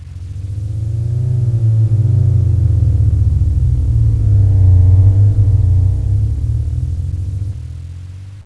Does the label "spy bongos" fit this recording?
No